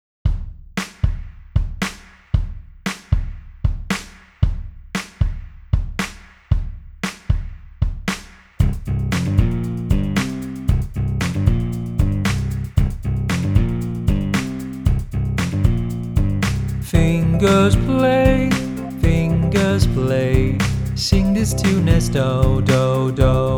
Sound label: Singing Fingers Play Fast